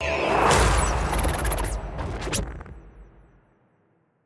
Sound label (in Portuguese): Sfx - Entrando No Portal E Transicao De Fase; Neon Souls; PS4 PS5 switch windows xbox one xbox series xs